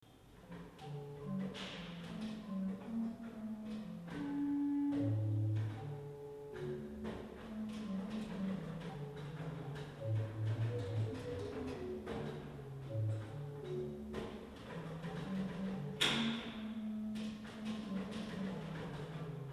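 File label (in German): Gedacktbass 8, Orgelstimmen; Orgelstimmen CD1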